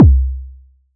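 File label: bass drum TUFF; ModelCycles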